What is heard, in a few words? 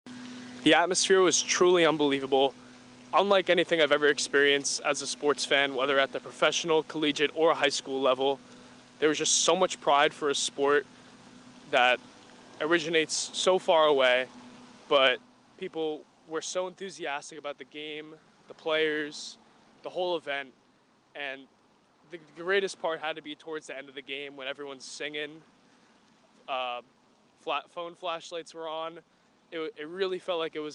Atmosphere